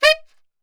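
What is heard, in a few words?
D#3 saxophone shot, Brass Super Section; Best Service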